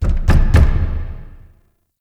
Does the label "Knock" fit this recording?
Yes